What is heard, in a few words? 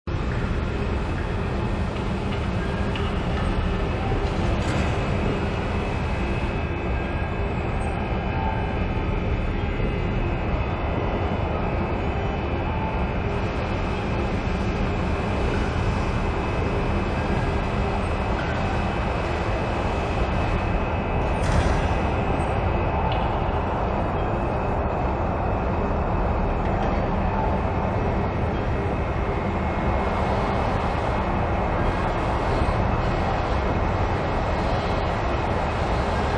Amb Facility Int